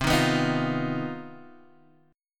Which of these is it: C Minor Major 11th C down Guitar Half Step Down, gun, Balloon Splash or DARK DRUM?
C Minor Major 11th C down Guitar Half Step Down